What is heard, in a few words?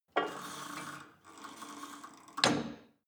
cable machine old pull and drop soft, fitnessstudio